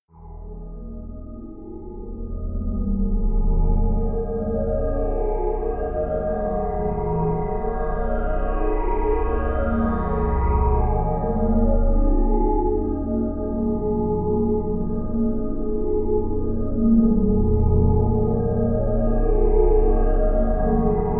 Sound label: drone mystery